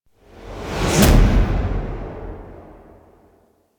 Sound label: stinger; Warframe; NineteenNinetyNine; Stage8ShitboxConvoy